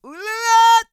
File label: SING, World Voices, CARIBBEAN